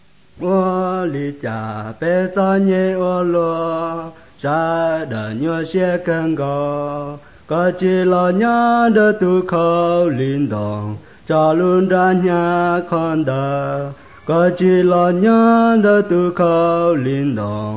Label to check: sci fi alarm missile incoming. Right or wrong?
Wrong